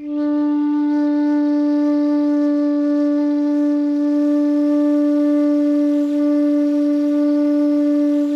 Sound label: FLUTE MF D4; Greytsounds - Philip Wolfe David Crozier's Saxophones; FLUTE MF-PW